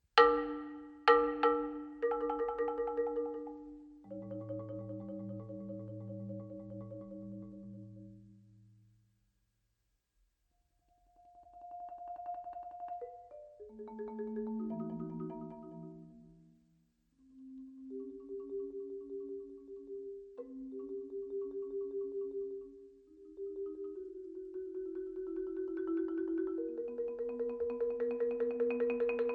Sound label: Marimba Music - Part. 3. Variations; Variations VII, IX